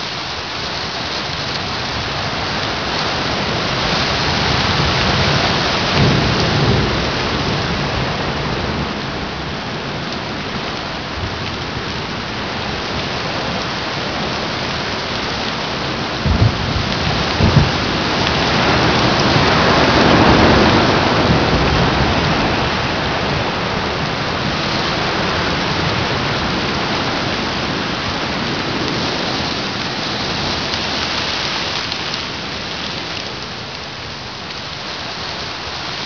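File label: rain exterior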